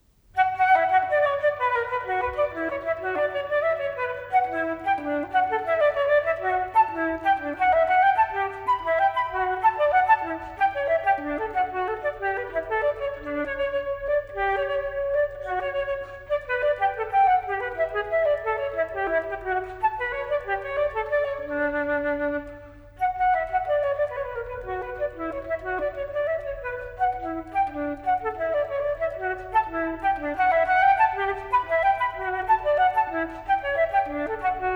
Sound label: Solo Flute; Telemann; TWV40-4